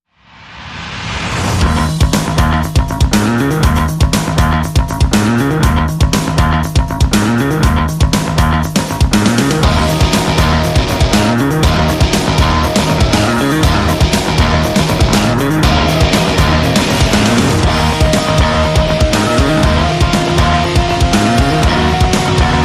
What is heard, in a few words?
No Horns